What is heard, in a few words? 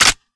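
Reload Handle Forward; Valorant; stinger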